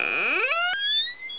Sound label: chest; McDonaldland - Silly Games; McDonald's; treasure